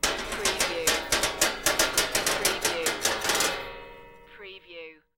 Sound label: Metal Drag Scrap Clanking